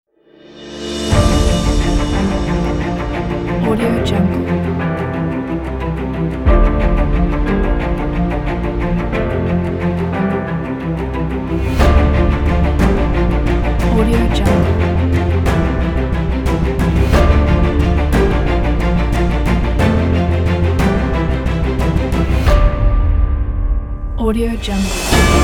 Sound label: epical